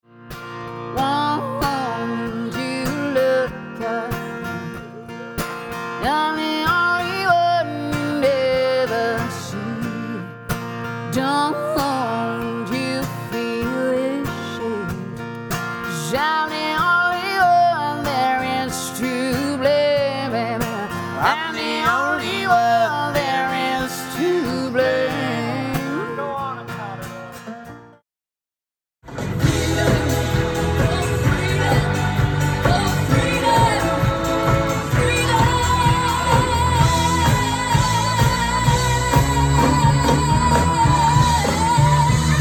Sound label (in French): CHANT PERSONNAGE LIVE